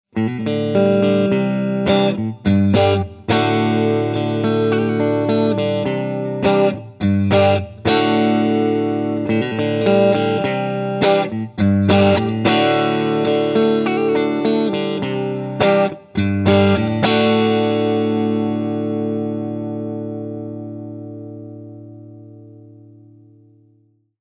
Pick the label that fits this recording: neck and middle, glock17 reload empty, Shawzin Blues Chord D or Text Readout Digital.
neck and middle